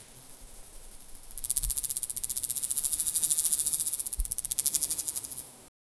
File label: multiple butterfly flying, insects